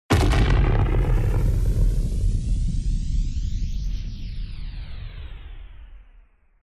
launch rocket

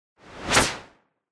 Whoosh

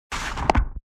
landed on the ground

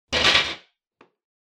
metal texture

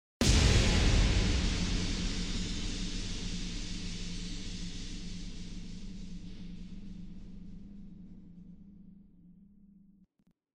UI comform sound

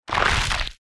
sticky movement

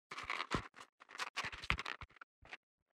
grabbing

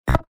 UI invalid sound

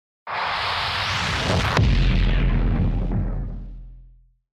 Superhero inbound

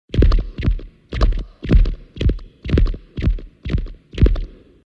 horse inside the castle

